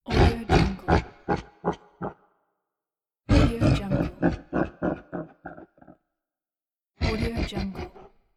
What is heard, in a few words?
the monster laugh